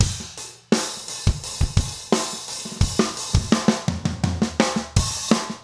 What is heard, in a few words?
rock 85